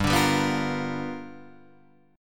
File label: G 7th Sharp 9th G chord, Guitar Open D